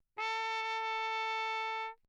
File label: TRP MUTE Bach Crown Mess; Perinétventiltrompete; Mutes